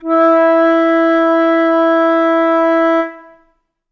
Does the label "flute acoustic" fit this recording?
Yes